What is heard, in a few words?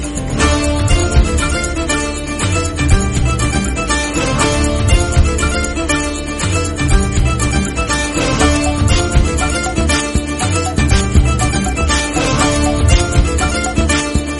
fight bgm remix